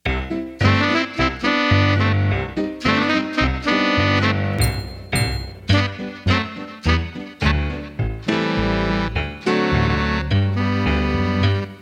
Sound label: instrumental track